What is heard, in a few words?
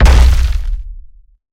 Jumpland Walk Run, Behemoth; Evolve